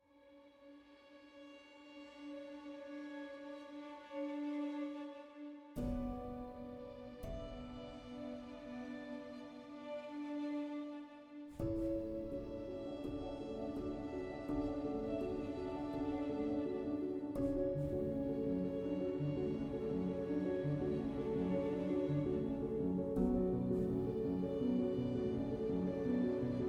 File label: Electronic Drama